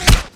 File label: hit player; Ace of Spades